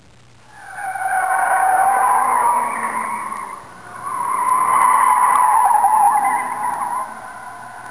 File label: Car Chase; Soundsation; Vehicles